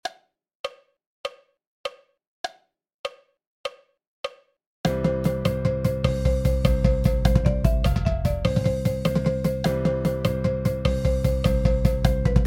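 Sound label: 100 BPM Cheesy Rolls with countoff, Marching Percussion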